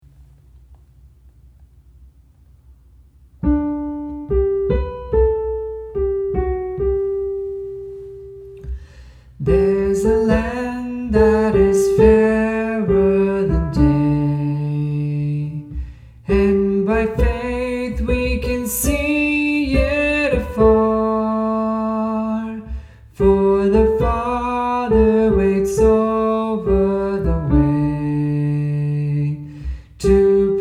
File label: Soprano